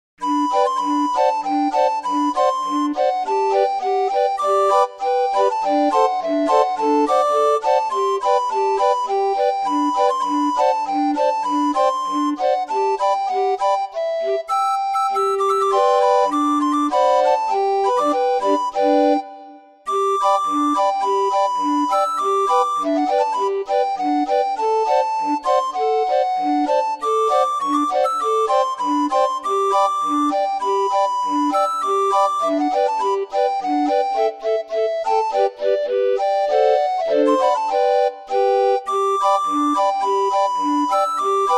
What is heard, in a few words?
Napoleon's March, orgue barbarie